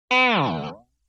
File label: Boing Box Short Hit; Vintage Cartoon; Hits & Tones